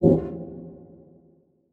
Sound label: Beep Whoosh; Multimedia Internet CD-Rom Flash